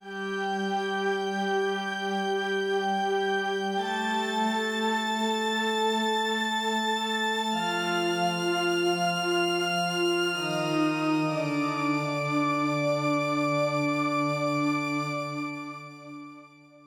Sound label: Pads; super crush k.o.